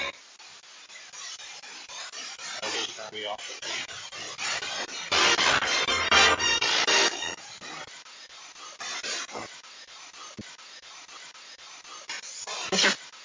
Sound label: 2nd Floor Landing; brookline private residence investigation